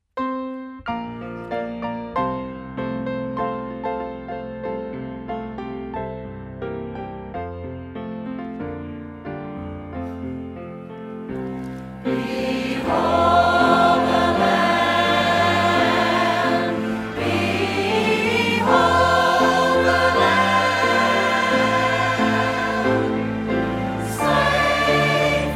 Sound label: Choir; Sunday Morning Worship